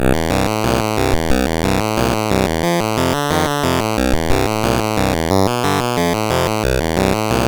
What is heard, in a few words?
sid MOS6581R3; Pestroy